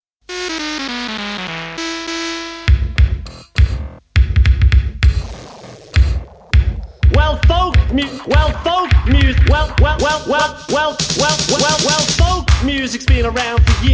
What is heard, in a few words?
folk rap